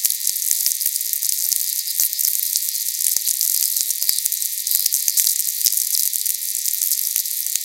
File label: chorus, VLF